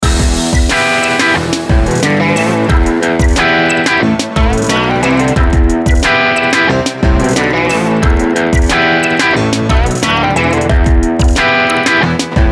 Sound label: Dirty Mustang, Funk Soul Rock & Beats